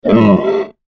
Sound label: Monster Pig Grunt; Creature; Animal; Pig Sound Effects